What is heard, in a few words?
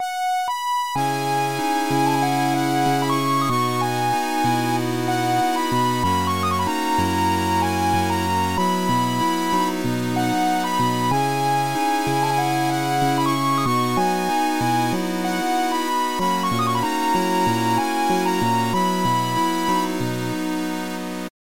8bit